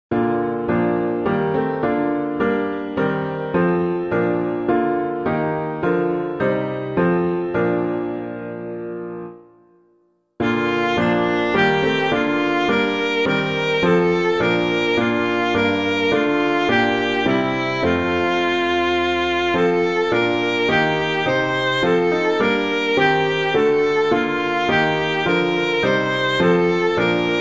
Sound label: We Walk by Faith and Not by Sight, SAINT ANNE, piano, hymn